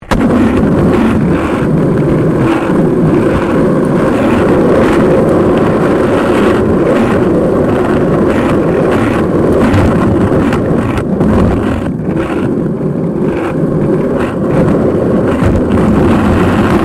SKATEBOARD UNDERSIDE ASPHALT ROUGH; Skateboard sounds; Skateboarding (Sound Effects:Sports)

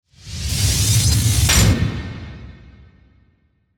Industrial Whoosh To Hit Electric Metallic Stamp; Blastwave; Whoosh Sound Effects

